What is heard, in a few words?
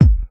clean kick single shot A key; kick drum samples